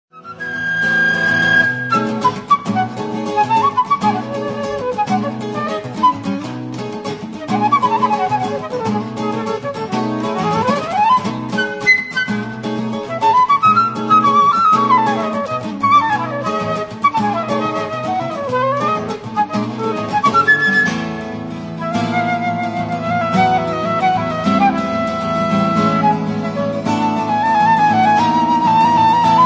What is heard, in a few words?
Flute Guitar